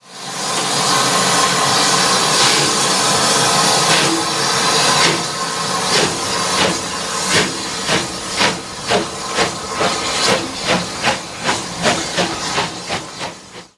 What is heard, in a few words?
rebuilt wc; simutrans; Pak128.Britain-Ex